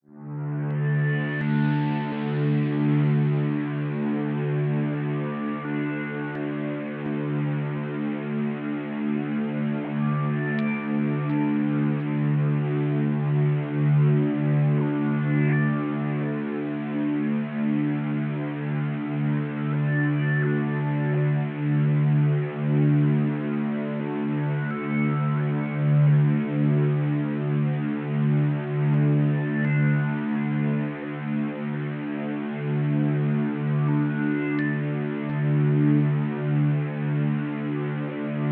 Atmosphere; highlander

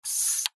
technology mixing desk fader slide; zapsplat

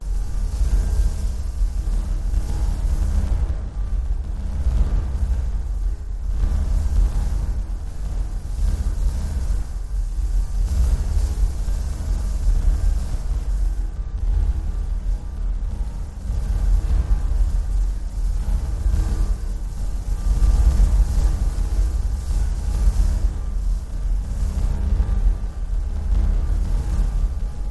crackling sci-fi pulse loop; the sound pack tree

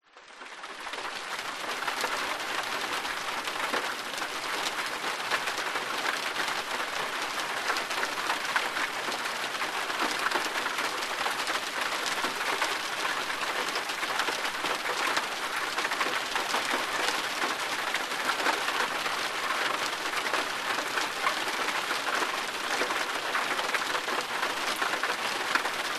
nature rain car interior roof; zapsplat

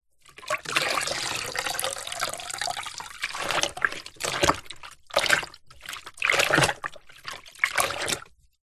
mop into plastic bucket water rinse splosh; household; zapsplat

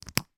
popper fastener wallet purse close; foley

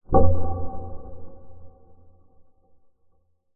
sound design metal clunk reverb dark; zapsplat

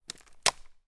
impact small branch twig snap with foot

